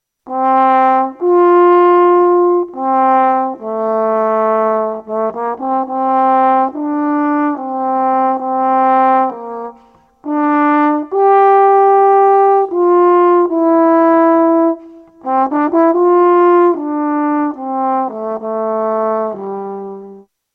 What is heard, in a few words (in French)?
tuba wagnerien